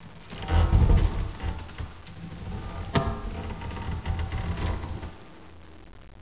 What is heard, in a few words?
wood creak